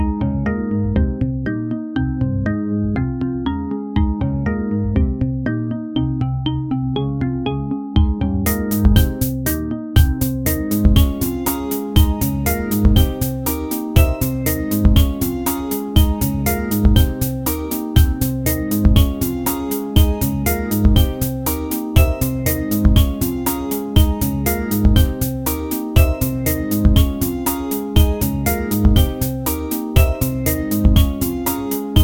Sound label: quiz sound is background